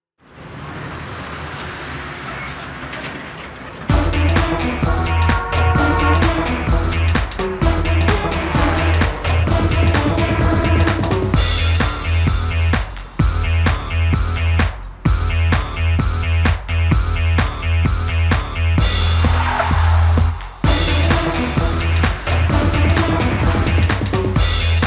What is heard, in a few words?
karaoke; colors